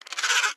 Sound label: penguin appear medium; Candy Crush Friends